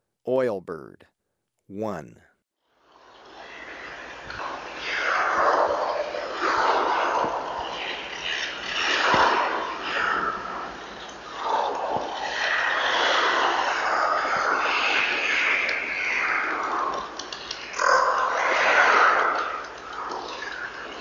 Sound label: Oilbird, Birds of Ecuador; S. America